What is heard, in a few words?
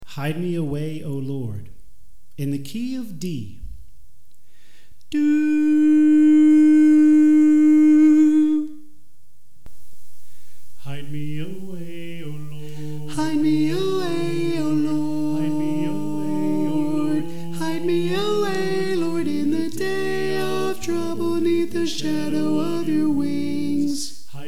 Alto